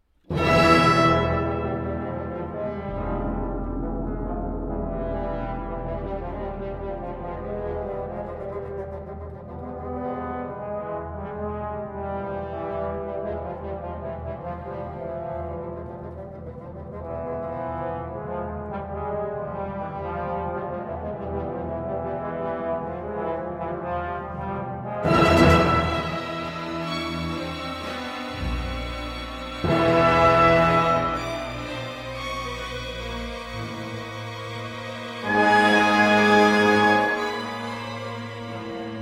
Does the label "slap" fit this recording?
No